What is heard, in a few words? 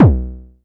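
Club bass drum, Jomox Airbase99, Drum Machines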